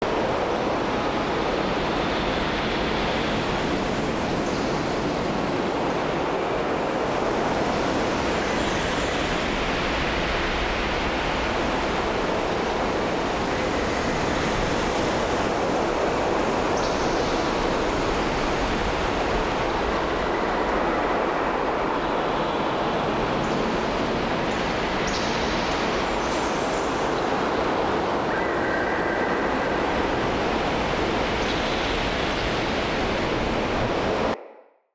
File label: Eternium Loop, Ambience, Garden, Beds